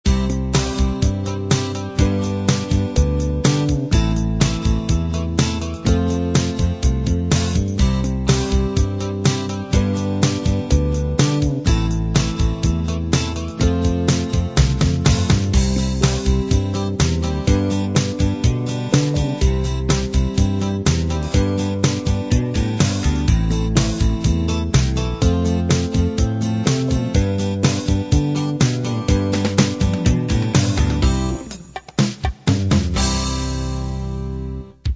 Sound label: light rock